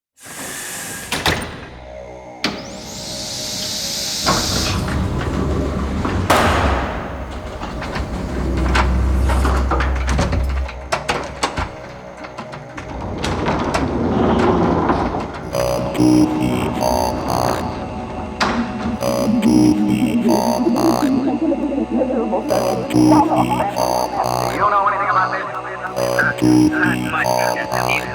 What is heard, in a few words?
instrumental; 例大祭15